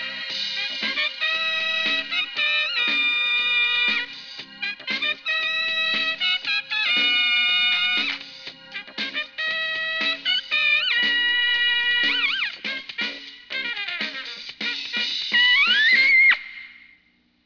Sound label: trumpet